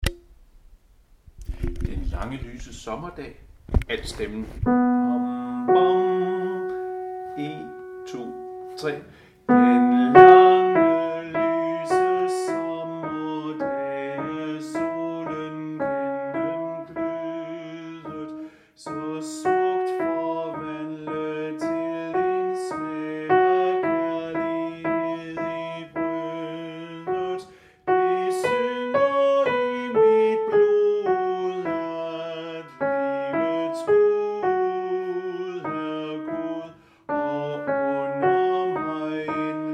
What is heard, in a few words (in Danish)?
Den lange lyse sommerdag, alt; harlev koret